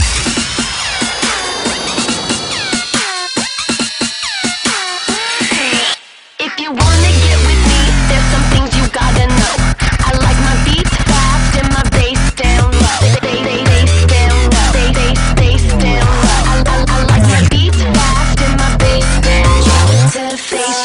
Remix

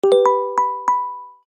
Windows 8 Email

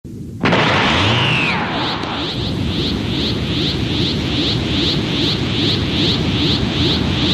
Powering Up

